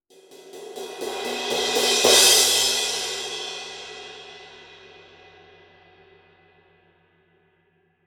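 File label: Medium Thin Low Crescendo, ride cymbal; Kerope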